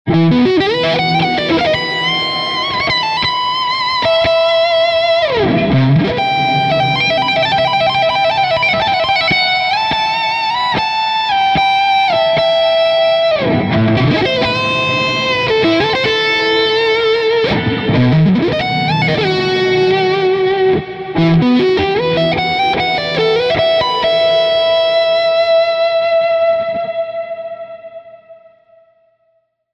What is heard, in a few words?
Ibanez JEM7V Carvin Legacy IIIs Eventide H9